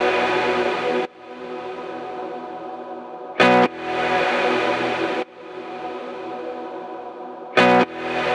E Gtr Washed Chops; Zero-G; Future Wave

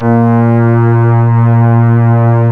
Synclav FM STRINGS A#; Big Fish Audio

